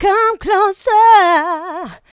mixed vocals; Houseworx

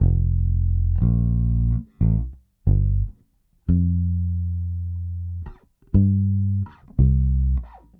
Bass, 70s Analog Funk

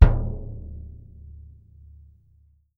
BD MF, Percussion; Miroslav Vitous - Percussion & Harp

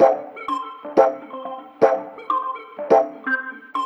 Funktek Flowin; Sonic Foundry (Sony Creative Software)

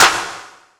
80s Drum Clap; Yamaha PSR E305

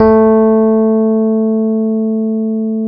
A 2, Electric Pianos; Wizoo

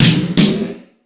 All Snares, E-MU Pro-Cussion

